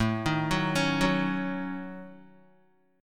A 6th Flat 5th, Guitar; A6b5; arpeggiated; JGuitar

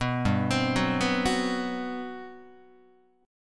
G Major 11th down Guitar; arpeggiated; JGuitar

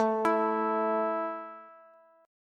A 5th A down Bass; arpeggiated

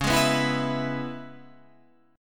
C sharp Minor 7th C sharp, chord

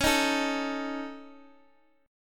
Db Suspended 2nd Sharp 5th, Guitar, Open D

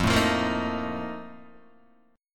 F 11th, Guitar, DADGAD; 3,0,3,3,0,1

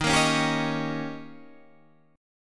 Eb 7th; strummed; 11,13,11,12,14,11

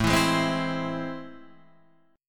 A Minor Major 7th

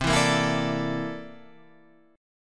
C 13th C down Guitar Standard; C13 strummed

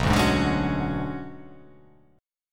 B Minor 6th Add 9th B; Bm6add9 strummed; x,2,0,1,2,3,3,3; JGuitar

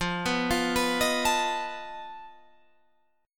F 7th Flat 5th F down

